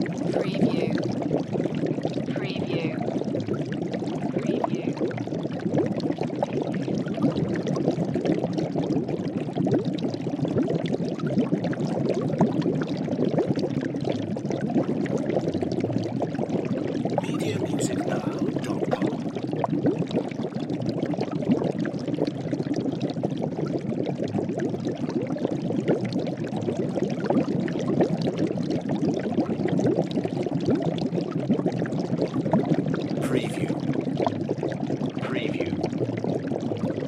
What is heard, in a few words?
Cauldron Large Boil